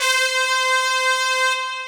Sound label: HORN